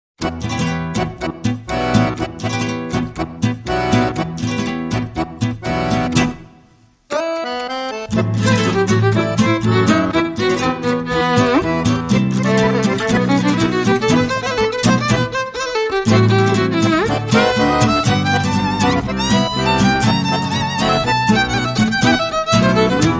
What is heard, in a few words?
No Drums No Bass